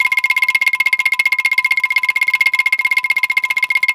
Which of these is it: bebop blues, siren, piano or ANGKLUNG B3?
ANGKLUNG B3